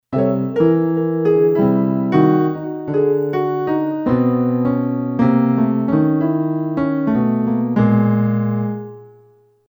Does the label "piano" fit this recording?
Yes